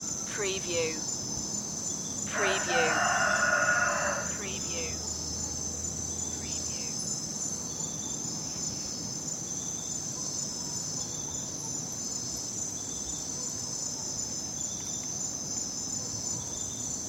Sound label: night crickets and birds